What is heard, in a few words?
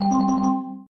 msn wizz